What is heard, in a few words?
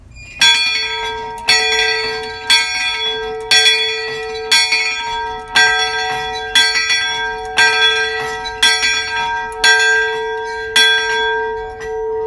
Bell